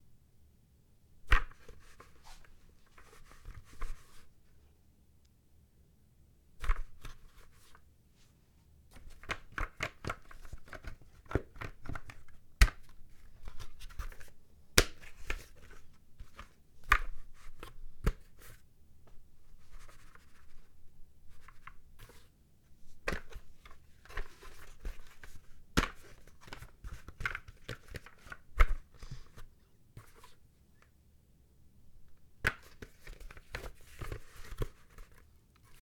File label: Construction Hard Hat Sounds